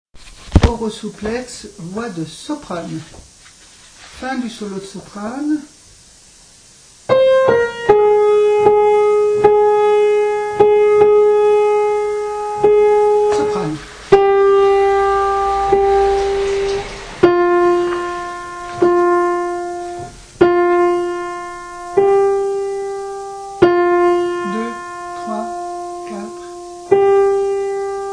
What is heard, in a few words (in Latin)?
Oro supplex, Soprano; Niccolò Jommelli, Missa pro defunctis; 9. Oro supplex